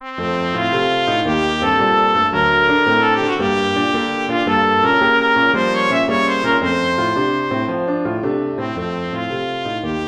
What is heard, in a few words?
Hora trumpet